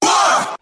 wah crowd; ooh ahh

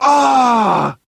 ah pain

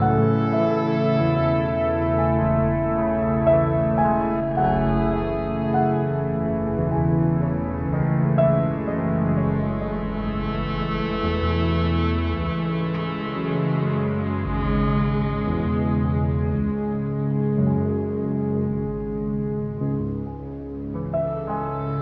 piano keys sax sleaze, Loops Sad

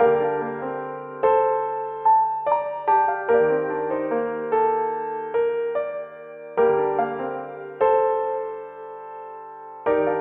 piano, Loops Sad

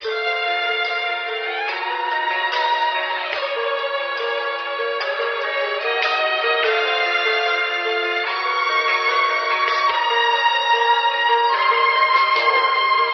strings, Phonky loops

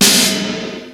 Snares, Real

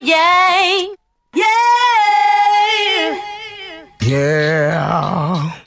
yay SUNG

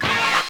Stabs

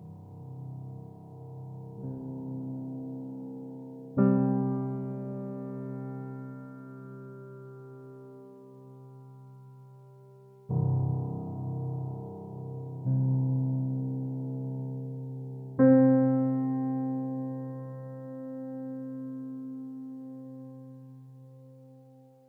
piano, Sad Loops

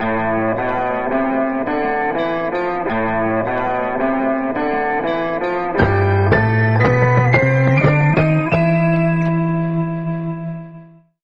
guitar and keys, Loops, Sad

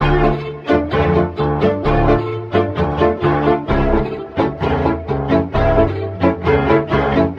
strings, Sad

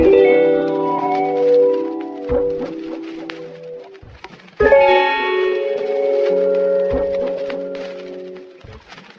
guitar echoed, Jazzmatazz loops

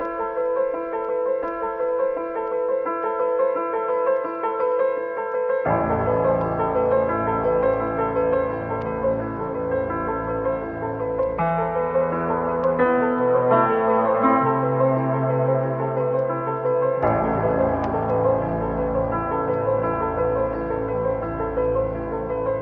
piano, Sad Loops

